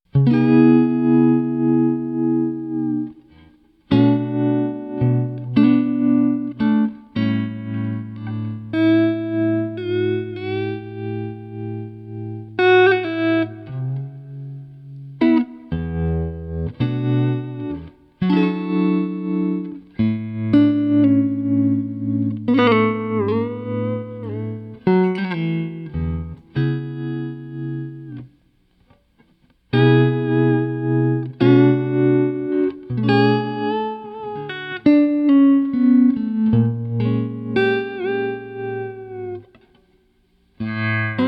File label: Chill strat